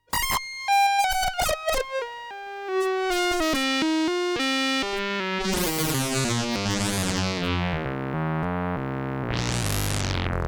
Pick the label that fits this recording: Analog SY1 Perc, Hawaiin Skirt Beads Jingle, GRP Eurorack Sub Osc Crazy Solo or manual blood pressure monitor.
GRP Eurorack Sub Osc Crazy Solo